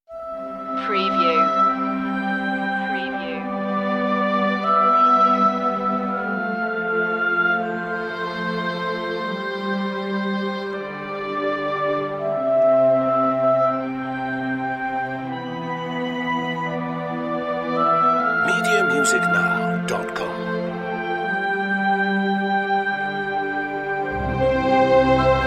no piano

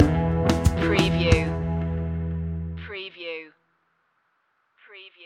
rumble in the jungle stinger

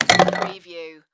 Air Hockey Puck Goal; sound effects bible

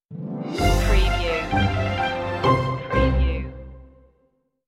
light hearted stinger

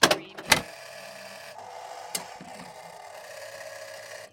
Pinball sound, motor or crane move long

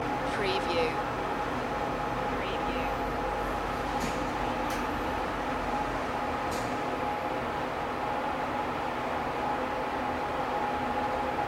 elevator ride loop

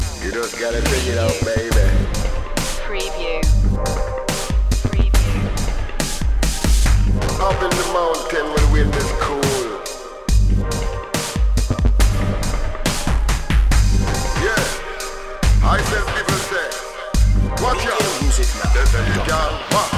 ice ragga loop

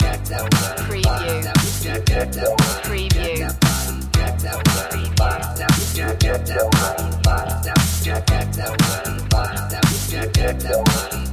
daft funk

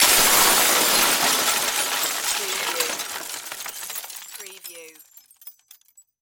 Debris Glass Plastic

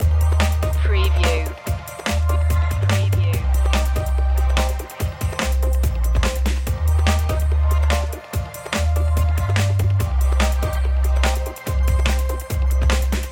india charm loop

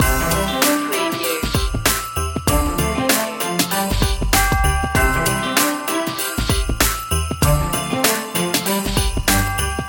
festive funtimes loop

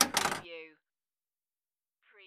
Pinball sound, ball hits and vibrates

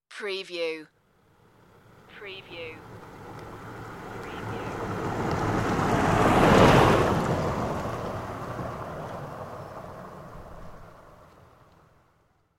pickup passing

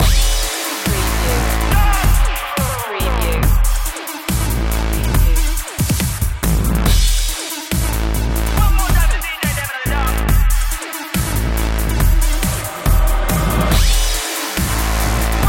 beats loop

